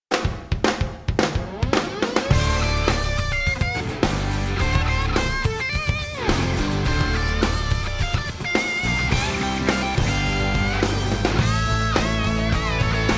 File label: Instrumental